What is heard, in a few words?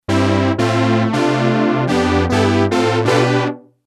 lfo env; nord3